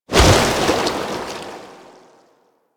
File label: Tidal Wave End; Warframe; Warframes; Pirate